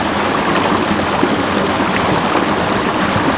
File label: Small Waterfall; 暴力赛车